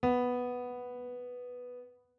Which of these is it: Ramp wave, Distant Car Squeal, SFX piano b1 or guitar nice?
SFX piano b1